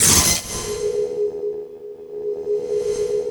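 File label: BYRD STRIKE, HYDRA BLAST; Spectrasonic Distorted Reality 1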